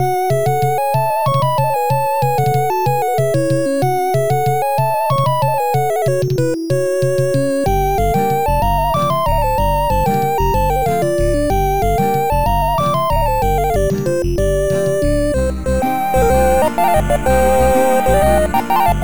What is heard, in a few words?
amiga hiscore; ghouls and ghosts